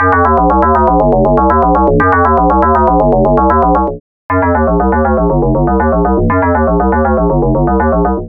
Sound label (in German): PM ohne und mit AM